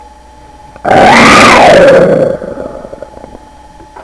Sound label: Growl